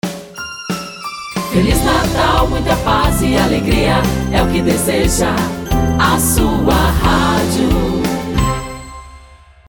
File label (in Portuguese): vinheta natal